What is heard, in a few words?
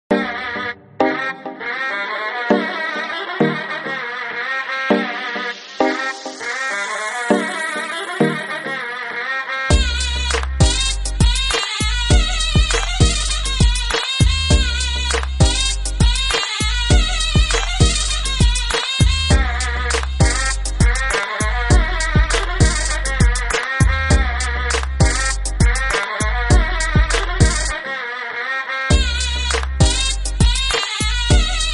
Oriental ZURNA Remix